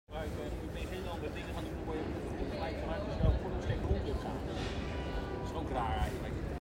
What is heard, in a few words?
Streets, Rotterdam Field Recordings